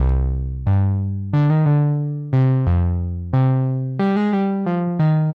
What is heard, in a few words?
synth dry